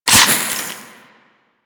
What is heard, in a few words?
Assault Rifle Reload; Weapons; Warframe; Grineer